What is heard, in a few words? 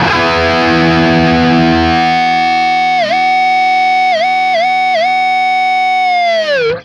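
power E cut, guitar power chord; East West; Steve Stevens Guitar Samples Collection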